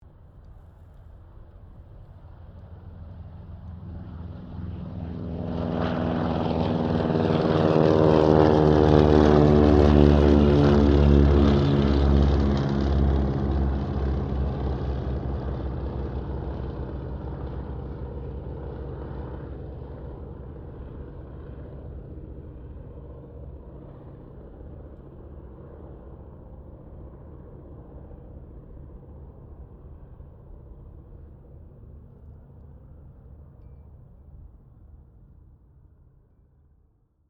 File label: AIRCRAFT C195 SQUADRON 2 TAKEOFF, Squadron of 2 Cessna C195s; Airplane; Airplanes; Vehicles